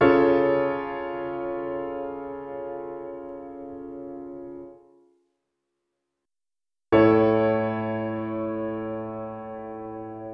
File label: four voice chord positions